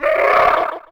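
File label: goblin yell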